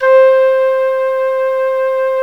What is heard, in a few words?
piccolo; GMTones; Pipe